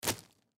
Bread Loaf Set Down, Bread Loaf Set Counter Top Settle Bag; Foley Bread Loaf Set Counter Top Settle Bag Movement Food; Blastwave